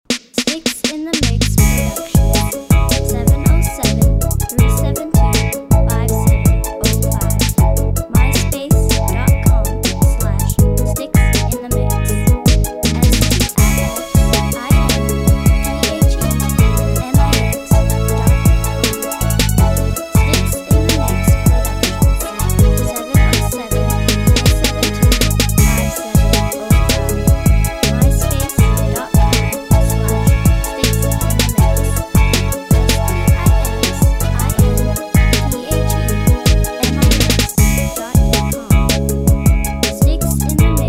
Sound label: 70s funk